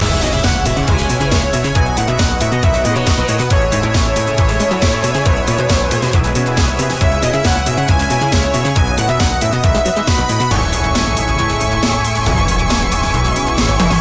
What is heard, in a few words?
retro techno assault loop